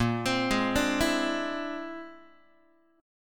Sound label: Bb 9th Flat 5th Bb down Guitar 4A,4E,5A,5D,5G sharp,6C sharp; arpeggiated; JGuitar